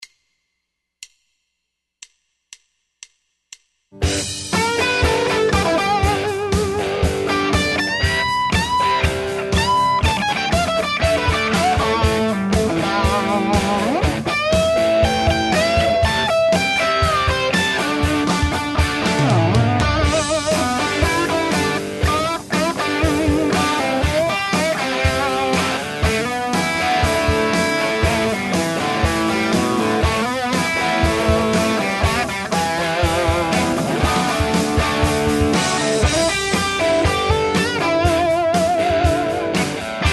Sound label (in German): Bass lernen Einführung Rock Playalong